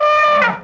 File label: new elephant hungry; Eft